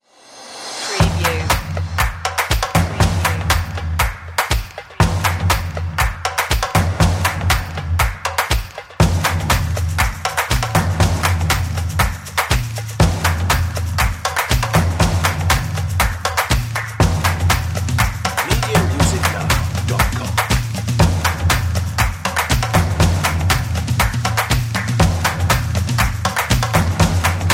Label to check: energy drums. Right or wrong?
Right